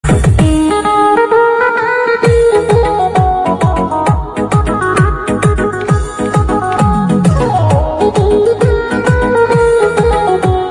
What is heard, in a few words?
Instrumental Bgm Tune Entry Bgm Instrumental Ringtone; Mari